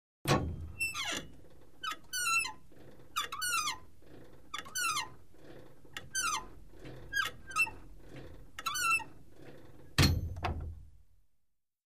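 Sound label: Gym Weight Equipment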